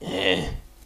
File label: heh